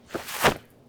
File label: Jumping On Grass